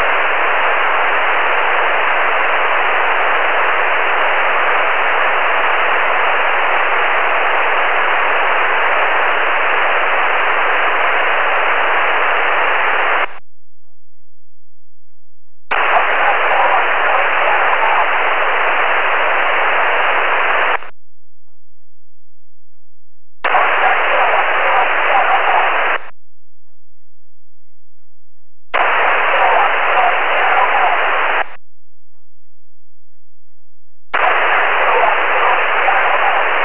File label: own SSB echoes